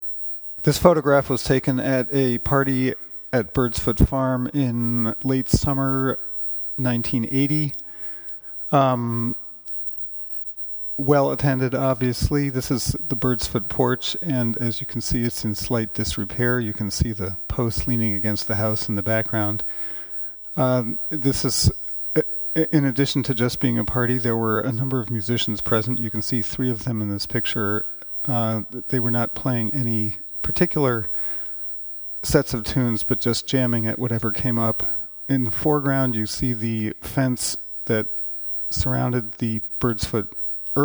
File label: making music porch; birdsfoot; Back to the land